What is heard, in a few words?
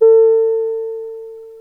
1 tone chime